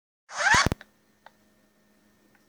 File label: record scratch